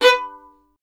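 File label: STR violin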